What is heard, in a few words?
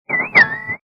guitar cut